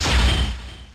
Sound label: Steel Wing; pokemon reborn e19 2022